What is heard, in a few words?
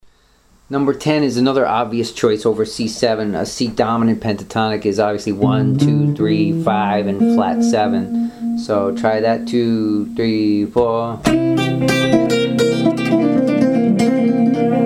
advanced minor pentatonics